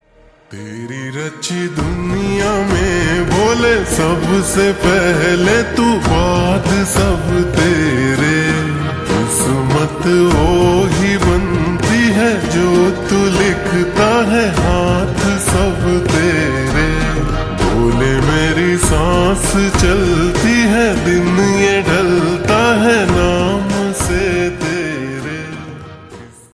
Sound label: Slowed Reverb Ringtone